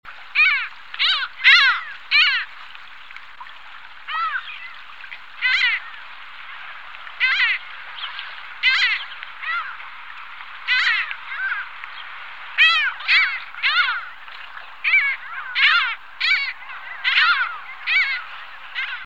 gaviota cabecinegra

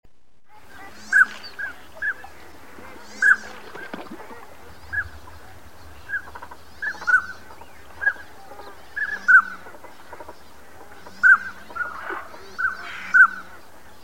anade rabudo

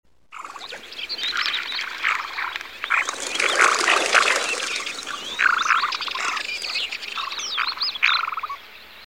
ganga ortega

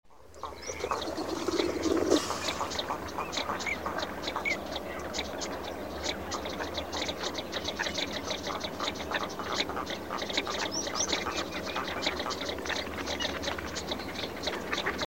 cuchara comun

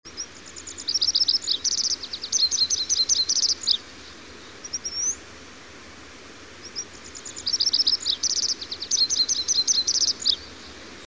lavandera cascadena

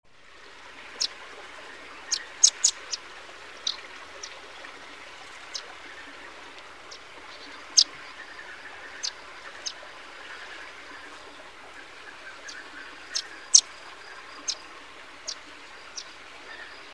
avion roquero

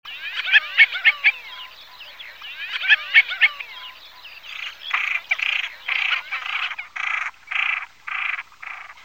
porron europeo

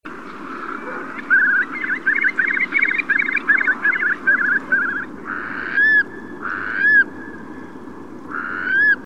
zarapito real

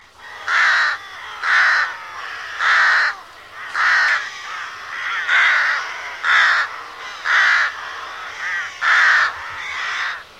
graja

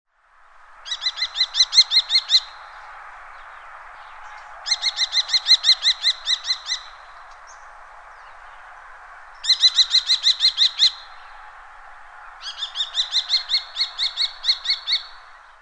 cernicalo vulgar

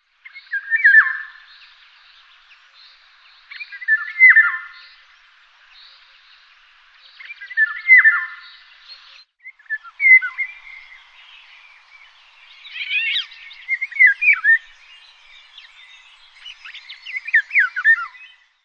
oropendola

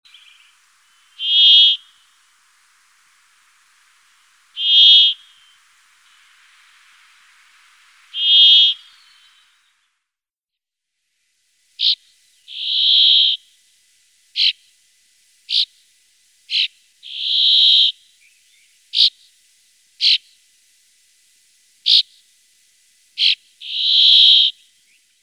pinzon real

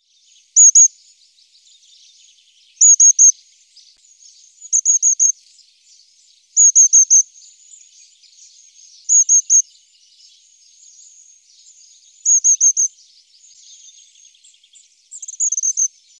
mito

